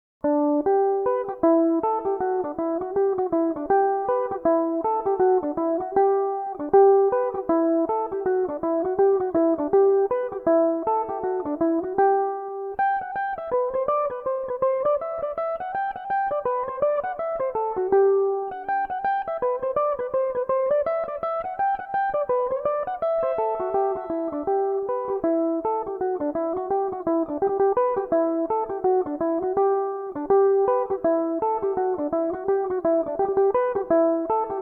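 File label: G major, mandolin; Gan Ainm 16; Belmuse EM-190 electric mandolin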